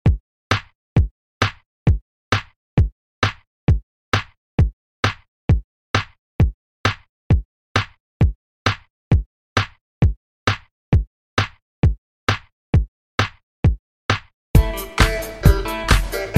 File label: Dj Intro Outro 132Bpm